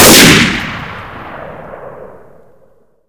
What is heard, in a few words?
scout fire, weapons; gunfire; source engine; left4dead2